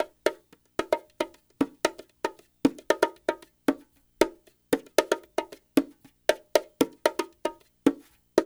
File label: BONGOS, Loops; East West